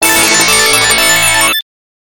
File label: fanfare; Sonic Spinball